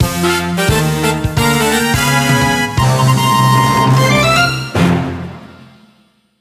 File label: Jingle; CapToad Switch Ending Unused